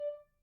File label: Grand Piano Main Release D4, Kawai; Chordophones; Releases; Legacy